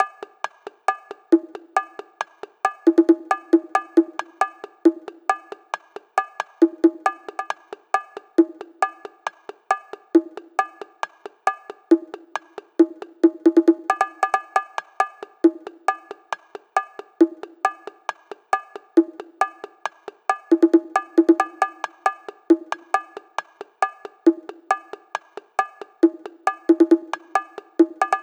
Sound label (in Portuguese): arrocha 68 bongo